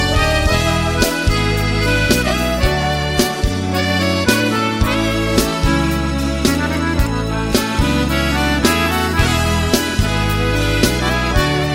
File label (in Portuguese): Gospel Retro